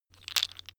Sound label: tiles wood rattle; objekte; holz